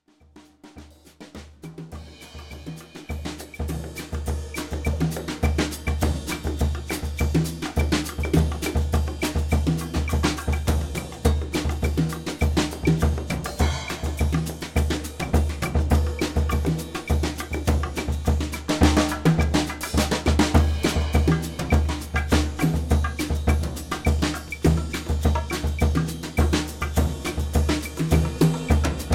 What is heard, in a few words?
Samba Shift